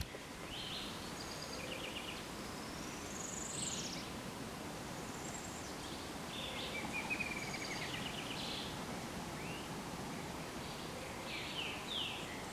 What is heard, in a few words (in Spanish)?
yeruva